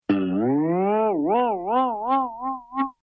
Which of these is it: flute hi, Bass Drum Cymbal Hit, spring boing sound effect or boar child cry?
spring boing sound effect